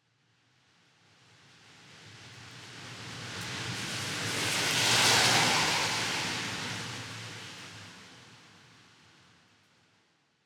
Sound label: wet street texture pass by, Urban Passes By; Designed; LR; aXLsound